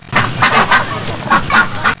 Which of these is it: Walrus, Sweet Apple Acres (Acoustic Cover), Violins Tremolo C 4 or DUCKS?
DUCKS